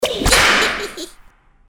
hit; freak fortress 2; cream

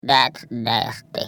fart reply; Oddworld; Munch

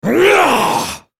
spellcast effort; Volibear

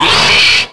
alien pain; xenomorphs

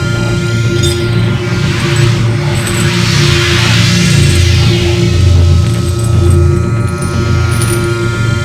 chase; tf; slender; prisoner

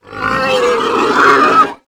death; tf; slender; gabel

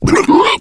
male boomer disrupt vomit, zombie; Left 4 Fortress

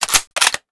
bolt, weapons; hl2dm; scout

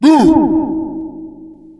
halloween boo, ghost

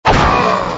death; hl2dm

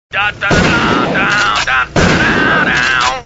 tank; hl2dm

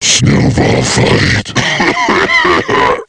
attack; tf; slender; kfxmas snowhusk

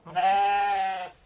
sheep

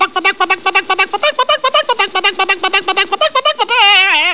chicken squak

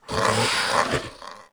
serpent; tf2pets

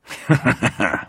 laugh

